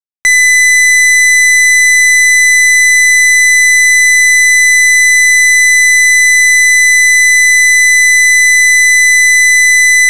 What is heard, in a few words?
SINE WAVE; Knights